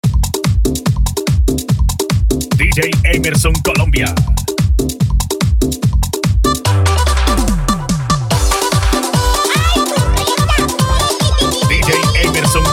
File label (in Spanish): Remix Rumba Kids